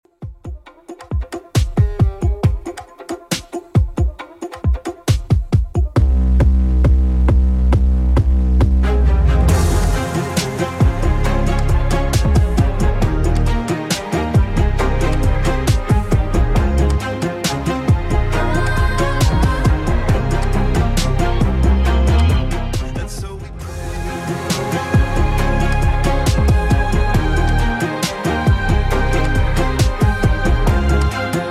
Top40 Redrum 68bpm

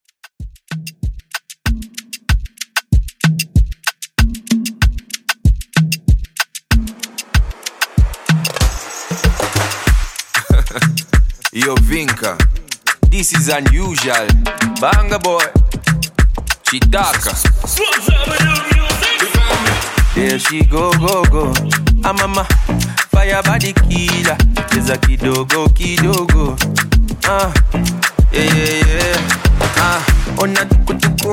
Afrobeat 95Bpm

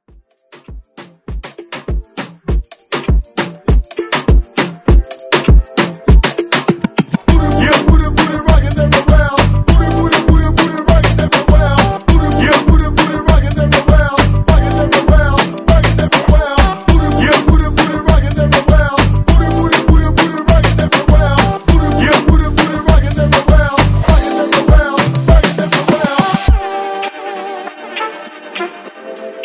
Moombah Hype

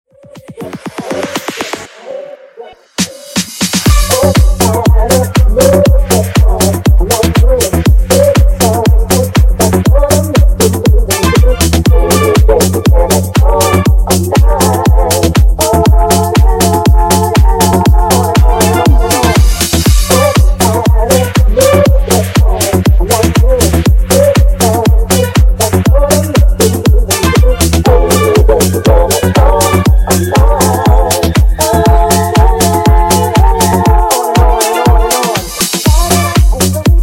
Funk Clean 120 Bpm

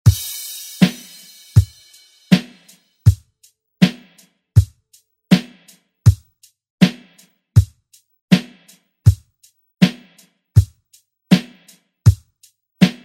70s ReDrum; DJ Mhark

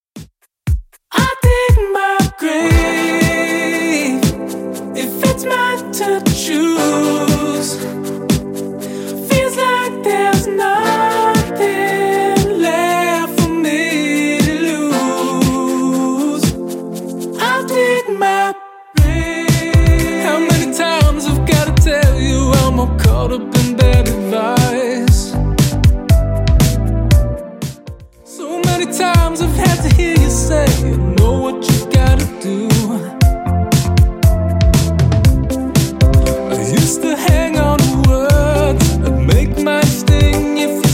118bpm

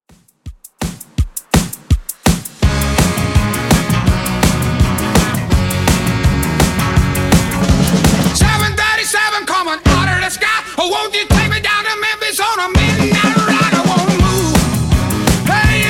70s Redrum 166 bpm

